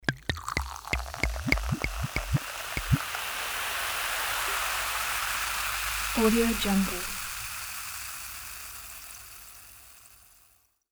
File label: soda